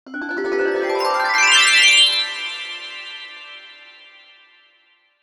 Magic chimes transition reverb

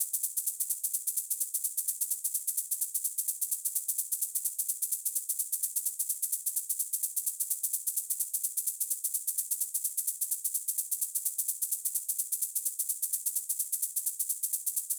shaker, Key D# Bpm 128; Big Room House; Loop Nerds; Big Room House Allstarz

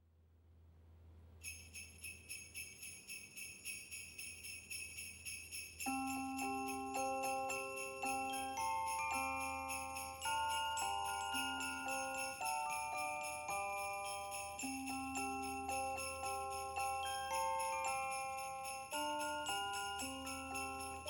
Symphony Orchestra Jingle Bells; Vienna

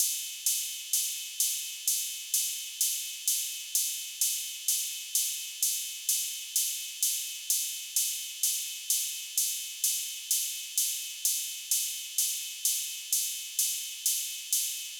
ride, Key D#, Bpm 128; Big Room House; Loop Nerds; Big Room House Allstarz

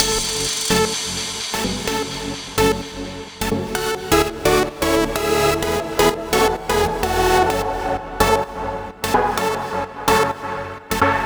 FULL MIX, Big Room House, Key A, Bpm 128; Loop Nerds; Big Room House Allstarz

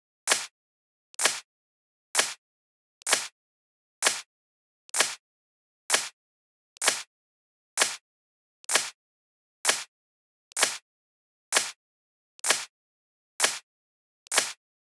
snare, Key E, Bpm 128, Big Room House; Loop Nerds; Big Room House Allstarz

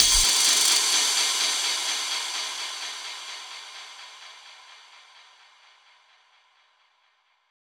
fx cymbal, Key F#, Bpm 128, Big Room House; Loop Nerds; Big Room House Allstarz

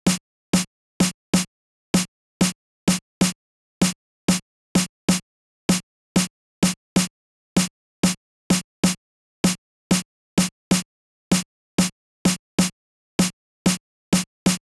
snare, Key E, Bpm 128, Big Room House; Loop Nerds; Big Room House Allstarz

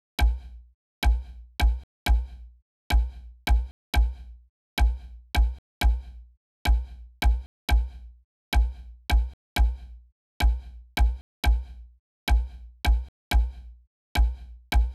tom, Key D#, Bpm 128, Big Room House; Loop Nerds; Big Room House Allstarz

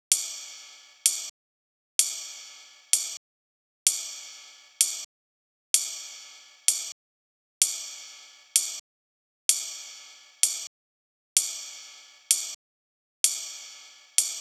ride, Key D#, Bpm 128; Big Room House; Loop Nerds; Big Room House Allstarz

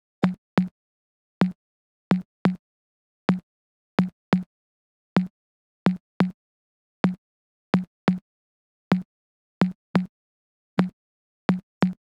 tom, Key D#, Bpm 128, Big Room House; Loop Nerds; Big Room House Allstarz

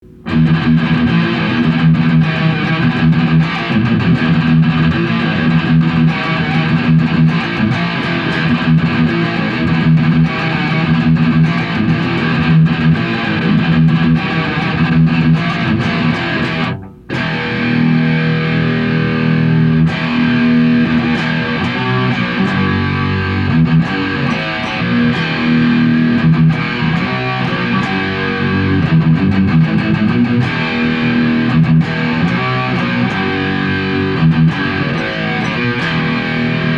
riff

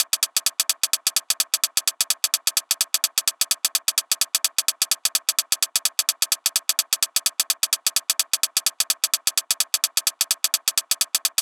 high hat, Key C# Bpm 128; Big Room House; Loop Nerds; Big Room House Allstarz

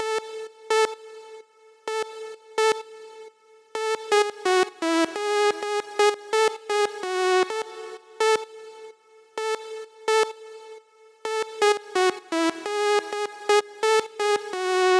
synth melody 2, Key D#, Bpm 128, Big Room House; Loop Nerds; Big Room House Allstarz

